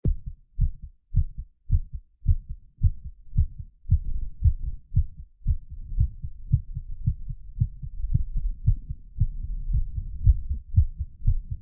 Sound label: heartbeat Exerciseing Beating Bpm160